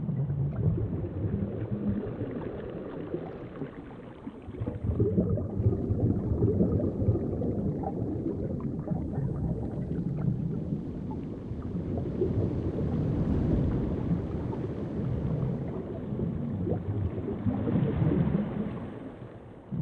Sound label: Ambiance - Water